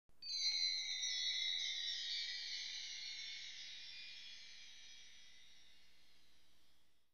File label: chime; Myst III Exile